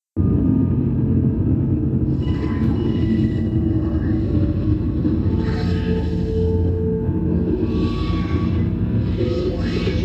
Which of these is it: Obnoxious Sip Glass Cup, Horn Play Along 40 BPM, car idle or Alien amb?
Alien amb